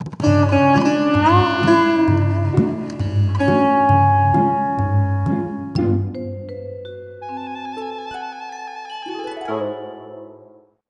Bari Sax

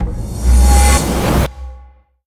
Ram Accelerate; we are the dwarves gamerip 2016

